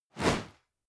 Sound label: whoosh classic; swooshes-swishes